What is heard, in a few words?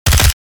Spec ops assault rifle suppressed fire